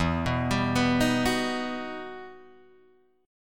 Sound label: E Minor Major 9th E down Guitar Drop D